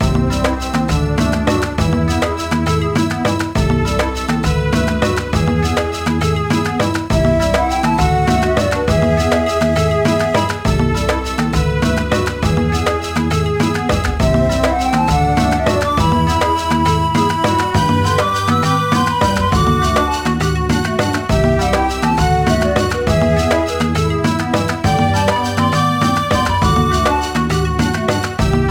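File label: background music level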